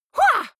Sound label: female fight shout attack; vocals; comedy-cartoon; soundpool